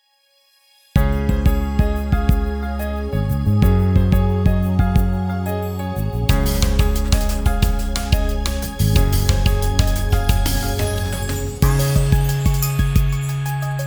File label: corporate jingle instrumental